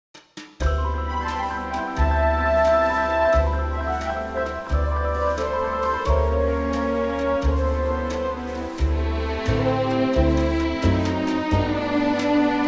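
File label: orchestra G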